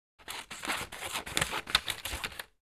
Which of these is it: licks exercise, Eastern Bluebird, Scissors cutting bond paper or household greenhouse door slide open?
Scissors cutting bond paper